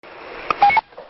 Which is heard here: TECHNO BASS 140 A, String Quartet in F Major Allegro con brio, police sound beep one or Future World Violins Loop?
police sound beep one